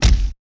fighting kick; soundscrate

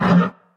monster roar short; soundscrate

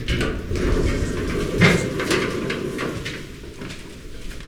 elevator mechanism wide; soundscrate

